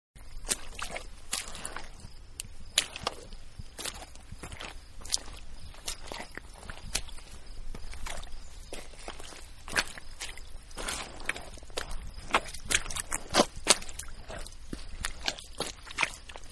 Walking Thru Mud; Footsteps; Human; Walking-Through Mud; Manhattan Production Music

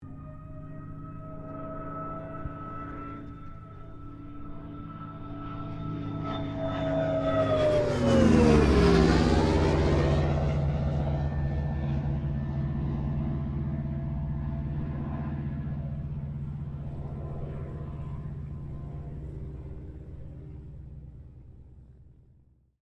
AIRCRAFT CA-13 BOOMERANG PASS STEREO

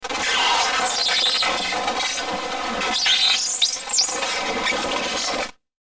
static feedback; Static Feedback Filter Squeal; Production Element Imaging Element Accent Transition; Blastwave

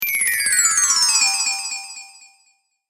Chimes High To Low Delay, Windchime

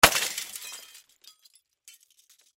Crash Glass Smash Crash Object; Smashes; Sound Effects:Objects

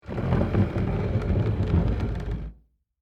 Table Slide Back; Foley; Backward, Wood, Movement; Slide Sound Effects

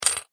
money coin drop coins, Coin Drops Onto Coins; Coin ( Single ) Drops Onto Other Coins; foley; Objects; McKinney Sound

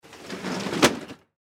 Fire Truck Rear, Fire Truck Rear Drawer Open; Emergency; Emergency Vehicles

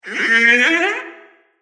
Laser Chaos Spiral, sci-fi sound effect

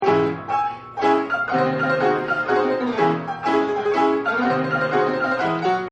Player Piano; Pianos; Musical

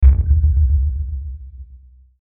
Hit Dark Short, Hit Dark Short Low Delay; Production Element Imaging Element Accent Transition; Blastwave

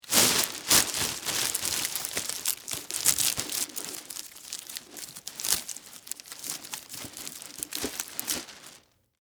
Garbage Bag Tie, Household, Garbage, Bag, Tie; Blast Wave

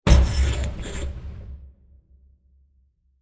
Noisy Impact With Metal Scratches; Burst Sound Effects; Bursts (Sound Effects:Impacts)

